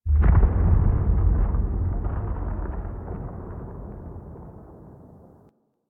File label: Landscape Glacier Ice Distant, Ambience; Warframe; Venus Open World; One Offs